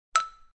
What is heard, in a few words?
XYLO 3; UISound